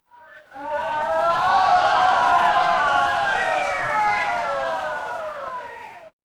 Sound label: crowd cheer